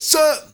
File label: MALE SOLO, MALE NOISE, Heart of Africa